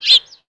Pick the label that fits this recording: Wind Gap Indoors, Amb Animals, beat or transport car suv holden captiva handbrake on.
Amb Animals